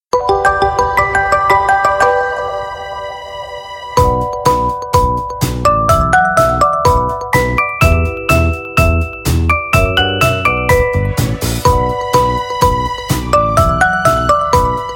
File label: marimba remix